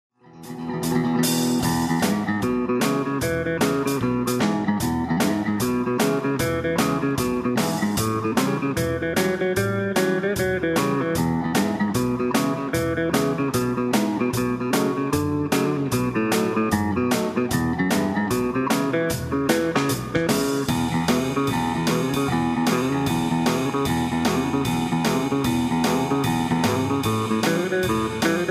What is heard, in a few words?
Guitar Boogie; level 2 with backing tracks